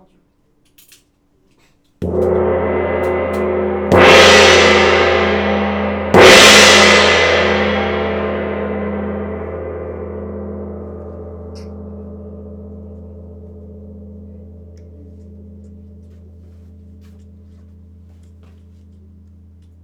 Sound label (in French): Petit, GONG VIBRATION